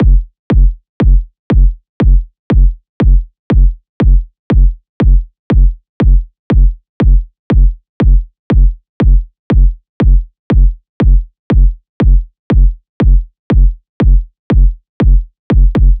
kick 120; Kick Loops; drum sample pack; SEISMIC; Slate

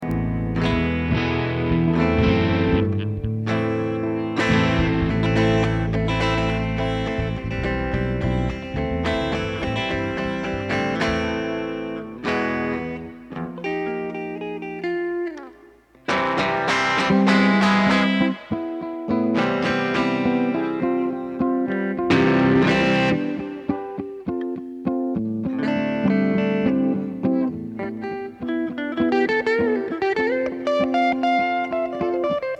Drum Samples Mix

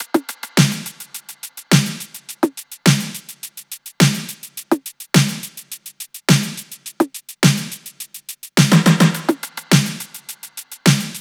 top 105, Drum Loops, Top Loops; SD MIDNIGHT Sample Pack; Slate

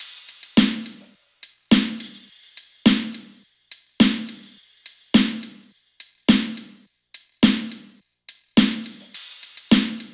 top 105; Drum Loops; SD MIDNIGHT Sample Pack; Slate

